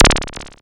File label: bleep, Korg ER-1; Kit 9